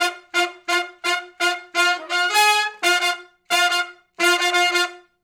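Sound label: Funk Riff (F) unison, Horncraft for R&B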